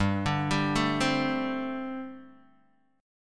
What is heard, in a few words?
G Suspended 2nd Suspended 4th, Guitar Open D